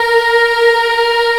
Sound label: A#3 CHILD EH, Choir; Acoustic & Synth; CHILD EHP; AKAI; USB Soundscan vol.28